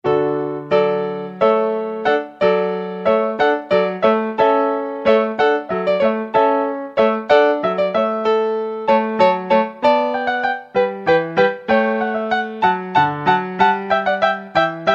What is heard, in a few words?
hornpipe, piano